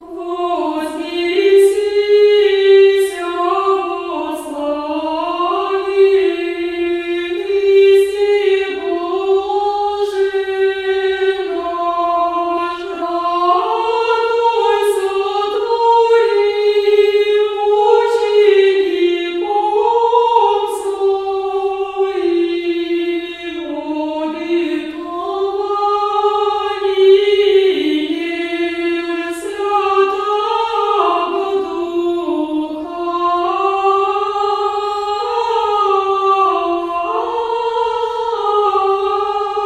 Тропарь Вознесению 4 глас

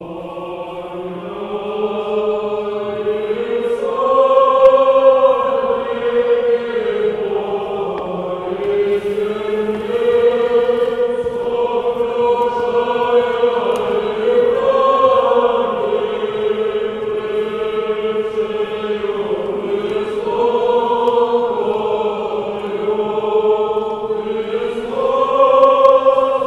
Рогожский хор 5 глас ирмос 6 песни

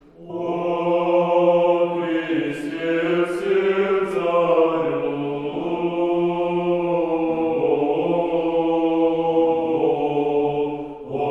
Сретение. 9 припев 9 песни; О Христе всех Царю; Казань

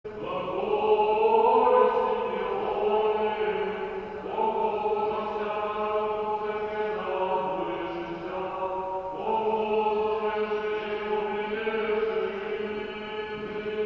Рогожский Хор; Стихера на Господи возвах Сретению; Глаголи Симеоне

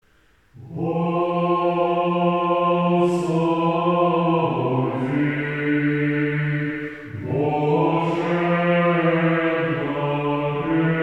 Стиховна 1 глас

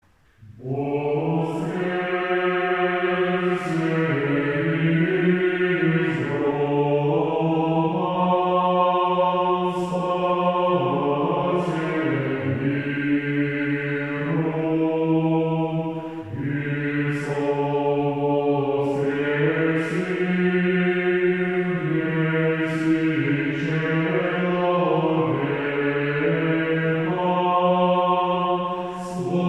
Стиховна 7 глас; Воскресе из гроба

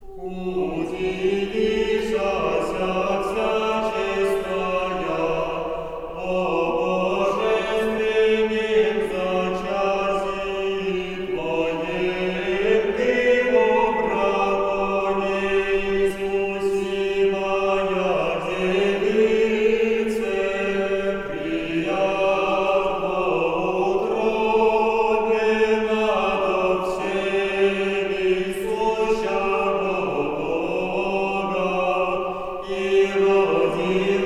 Ирмос 5 песни Благовещению 4 глас

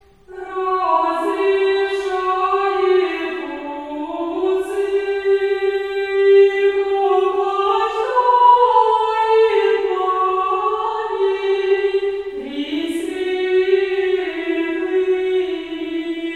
Пятидесятница ирмос 1 песни; Пятидесятница ирмос 1 песни 2016

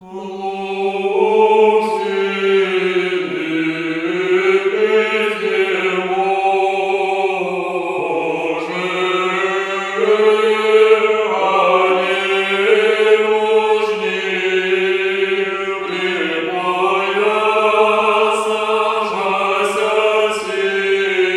Преображение. Ирмос 3 песни. Лук сильных. Протодьякон

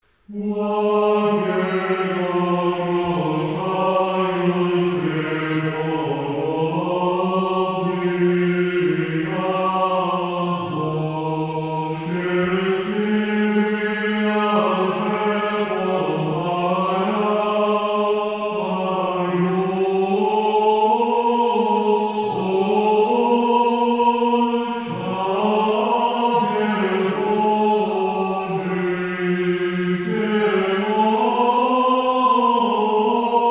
Успение 1 глас ирмос 8 песни; Пламень

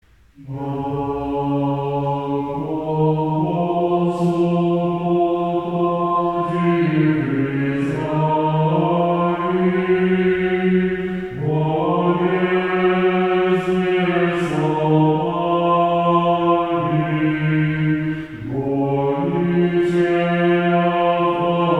ирмос 6 глас 1 песнь морозовские

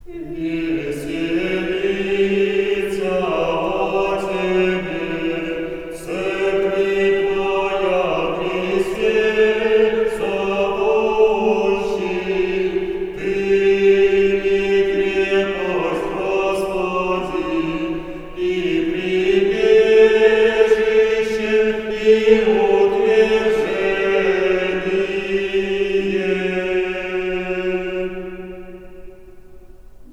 Ирмос 4 песни 4 глас

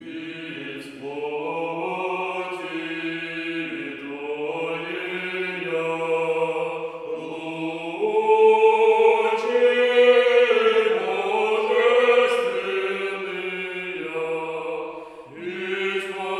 Преображение. Ирмос 4 песни второго творца. 8 глас

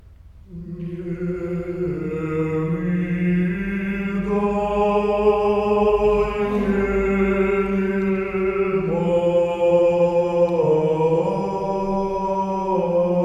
Хор певчих старообрядческих приходов Сибири, Ирмос; Не рыдай Мене Мати

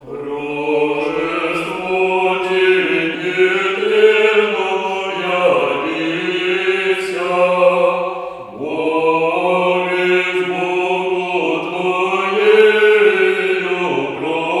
Преображение. Ирмос 9 песни; Рожество Ти нетленно

